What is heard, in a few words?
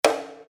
fx pop